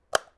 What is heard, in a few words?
switch, UI Audio; Kenney's Sound Pack